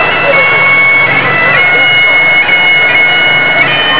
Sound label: bagpipe; picadilly